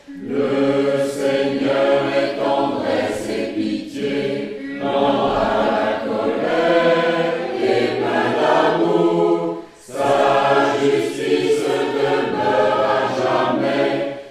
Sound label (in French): Tutti; Benis le Seigneur